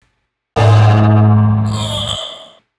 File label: Error